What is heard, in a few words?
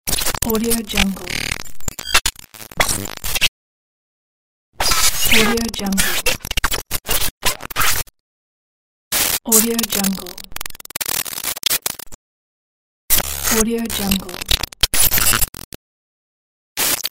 glitch noises; glitch noises pack; sonicside